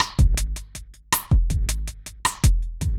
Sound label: Urban Beat, Drums